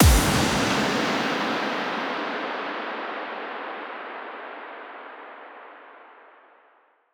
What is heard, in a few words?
IMPACT